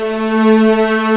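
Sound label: viola A3, ARCO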